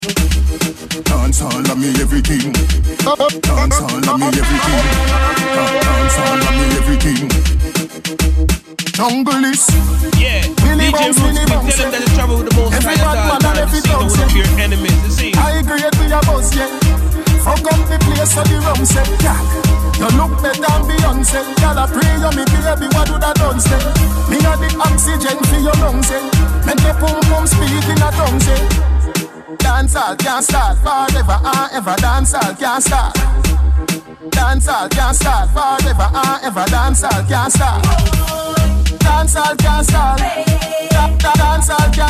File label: DANCEHALL